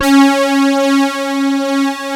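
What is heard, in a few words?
OBX PAD C5, Oberheim; USB Soundscan vol.49 - Analog Fantasies; AKAI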